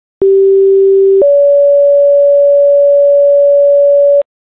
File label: page, tones